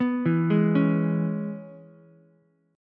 C sharp Minor 7th C sharp Ukulele; C#m7 arpeggiated; JGuitar

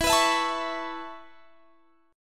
E Suspended 6th E

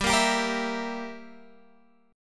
G Minor 6th, Guitar Open G

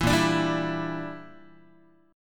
A sharp 6th Flat 5th, Guitar; A#6b5; strummed

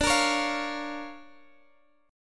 D Minor Major 7th Sharp 5th D Guitar Standard; DmM7#5; strummed; x,x,12,15,14,13; down; capo=3

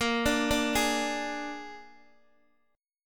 A sharp 7th A sharp, Guitar DADGAD

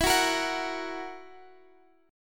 E Suspended 2nd Flat 5th E

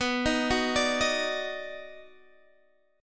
Eb Minor Major 7th Sharp 5th B

